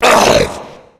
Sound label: nemesis pain; Reega kz